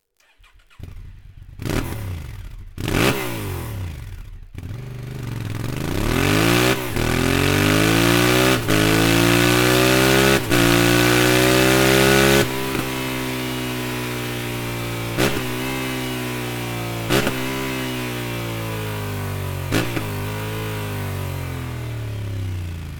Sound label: slip on; akrapovic